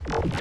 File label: Sci-fi Pulse Gun Blast